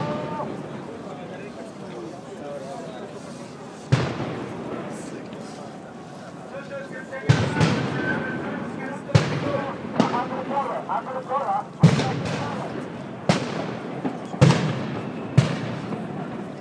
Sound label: lots of explosions